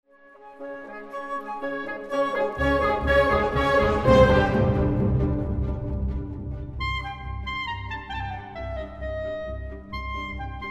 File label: Sax